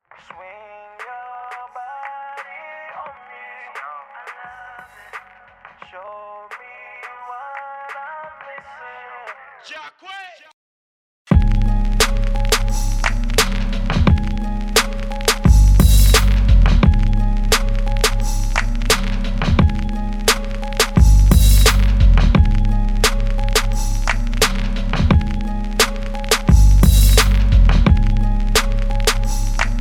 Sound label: Instrumental